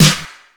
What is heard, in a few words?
perfect snare drum sound e key